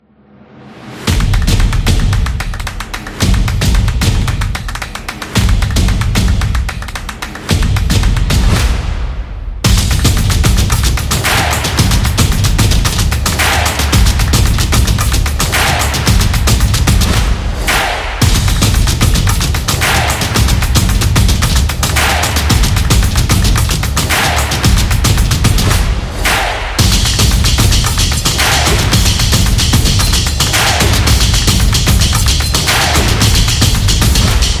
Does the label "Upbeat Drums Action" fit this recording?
Yes